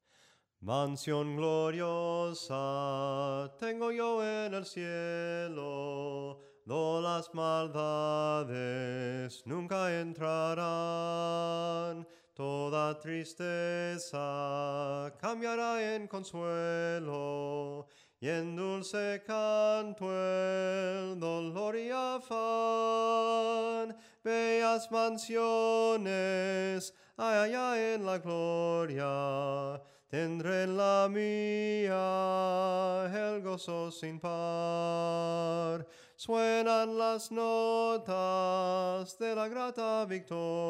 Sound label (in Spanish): SOPRANO CANTADO, himnos en melodia soprano